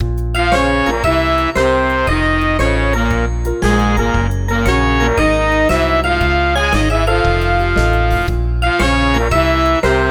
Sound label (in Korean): 트로트